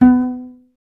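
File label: STR CBS, Solo Instruments, double bass; Miroslav Vitous; Solo Instruments VOL-2; Solo lnst. II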